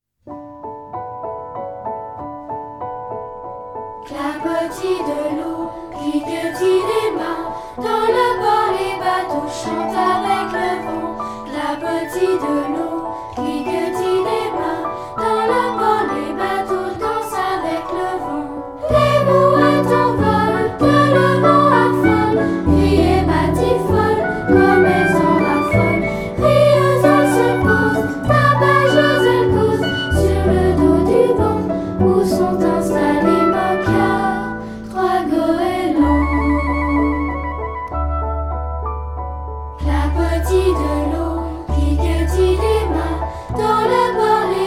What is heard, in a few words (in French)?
Version vocale; Dans un port